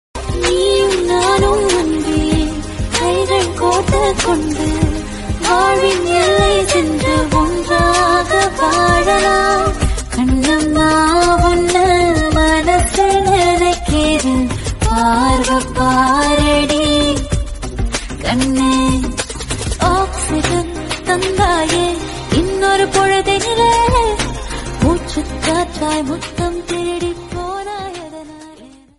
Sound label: friendship song female version tamil